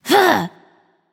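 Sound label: grunt attack, female; smite; voicepack; hera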